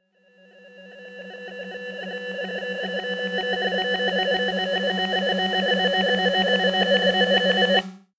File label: gate reverse noise swell; atmosphaeren umwelt; digital